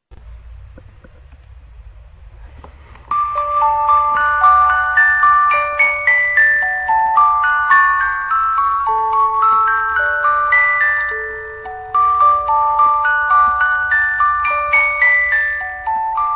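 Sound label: spieluhr, music box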